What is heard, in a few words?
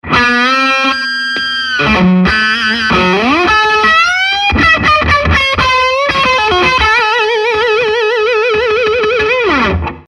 Bridge distortion solo, Greco LP Standard; Greco 1979 LP Standard Cherry Sunburst